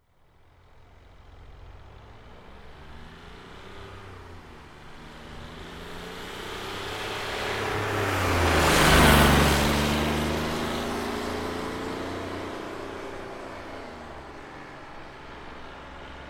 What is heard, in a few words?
Car Smart Car By Fast Accelerates; 2005 Smartcar Fortwo; Vehicles